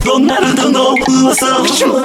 片头 C4; 音MAD; 蓝蓝路

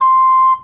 笛 C7; 幸运星; 小司

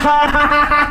哈哈哈哈 D5; 穿山甲

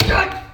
踢水瓶; 昊京

